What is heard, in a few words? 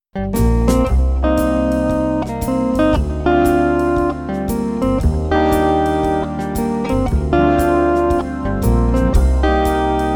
Steel